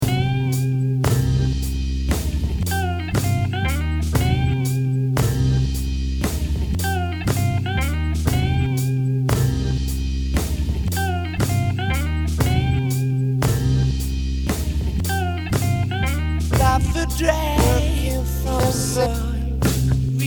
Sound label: beat